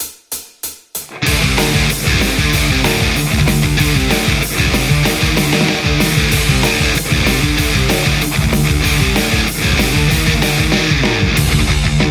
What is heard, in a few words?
metal strat; tse x30